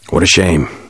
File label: taunt; jc denton